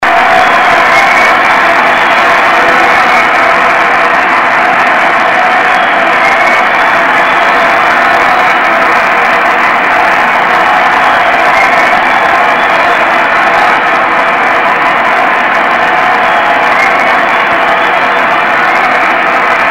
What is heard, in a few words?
applause